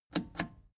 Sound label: plastic button scratch smooth click disable slow